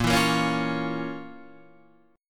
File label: F Major 7th Suspended 4th Sharp 5th Bb